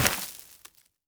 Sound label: land ground